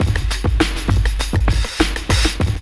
LOOP, DRUM; E-Lab; X Static Goldmine 2

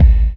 BDRUM; E-Lab; X Static Goldmine 2

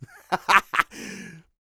LAUGH, Vocal Jazz Fx

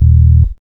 BASS; E-Lab; X Static Goldmine 1

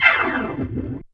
ORG FX; ORGAN; E-Lab; X Static Goldmine 2; akai

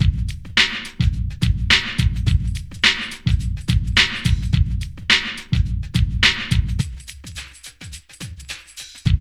PHAT LOOP; E-Lab; X Static Goldmine 2; akai

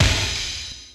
IND

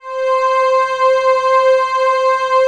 Atmo Pads; Best Service; XX Large

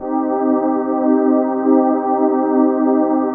PHASE PAD, ATMO PADS; Best Service; XX Large

